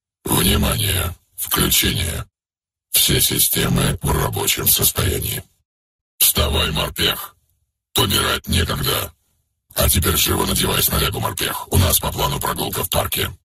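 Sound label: moj budil nik, my alarm clock, ringtone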